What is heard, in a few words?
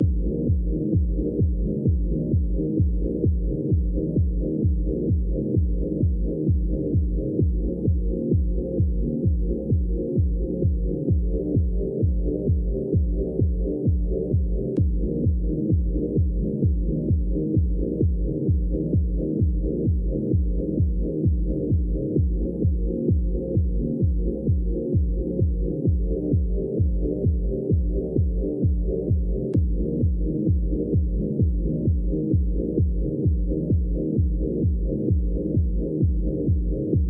freaking epic rave lowpass